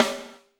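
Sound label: snare drum, Drums; Bob Clearmountain Drums I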